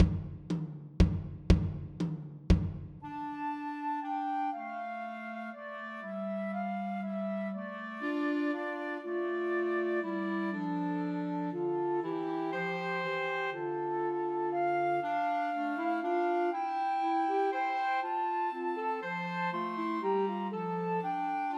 Christmas Trios for Flute Clarinet and Alto Saxophone; We Three Kings